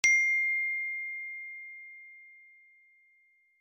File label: Iphone se note; zange iphone makhsose hame goshihaye apple